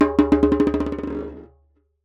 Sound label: Jembe Bounce; Percussion Samples